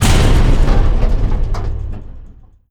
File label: railgun hit; weapons; Stellaris